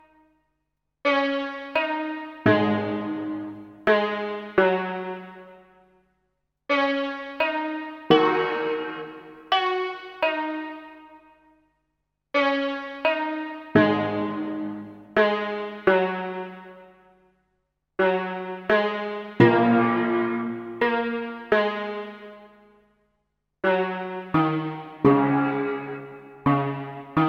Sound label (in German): Chinesische Melodie; Der Rhythmus-Globetrotter